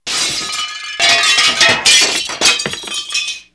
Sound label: household; GRSITES Graphics